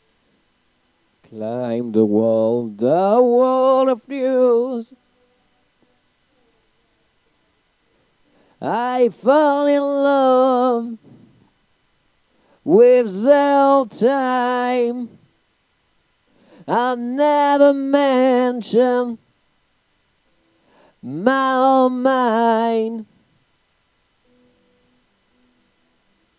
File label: chant